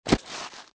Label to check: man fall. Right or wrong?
Right